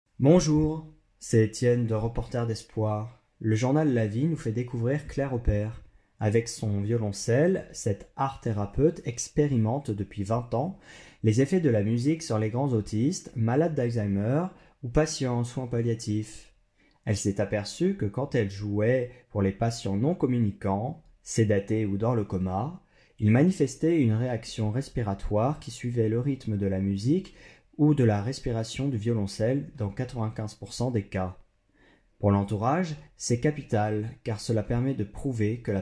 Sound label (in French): violoncelle